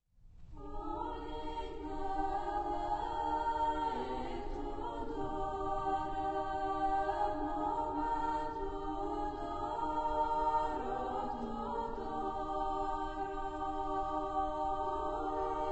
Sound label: Bulgarian Polyphony; World Sounds